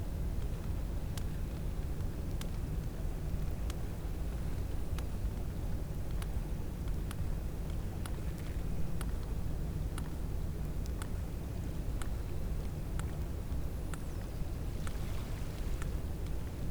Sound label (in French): cachalot positif, Physeter Macrocephalus